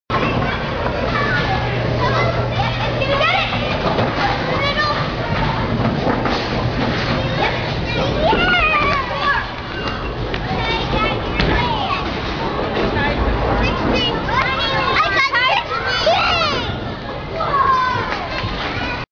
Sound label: Bowling